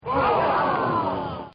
Crowd Says Whoo; Backgrounds

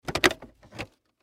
Toy Spaceship, Toy Spaceship Remove Piece Plastic; Toy Planes; Foley; Sound Effects:Toys; SoundEffects-Blastwave

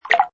Wet, Button sound; Multimedia

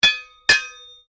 Strike Metal On Metal Heavy Ring Double; Foley sounds for melee weapons; Metal Sound Effects; Materials

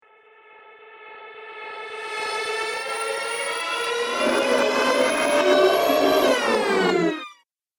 Whoosh Future Failure; sound design; Whoosh Sound Effects

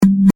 Multimedia Beep, Technology, Multimedia, Beep; Beep Sound Effects; Beeps (Sound Effects:Multimedia); BlastWave

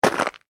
human footstep hard snow ice; Single Footstep On Hard Icy Snow; Footsteps; Footstep On Hard Icy Snow 5; McKinney Sound

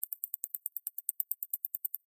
Insect Walk Small, Movement, Bug; Animal; BlastWave

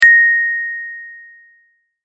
multimedia tone correct

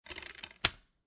Foley, DVD, Place DVD In Plastic Case; Set Downs; Objects

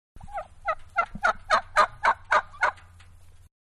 Turkeys Gobbling; Animals; Manhattan Production Music

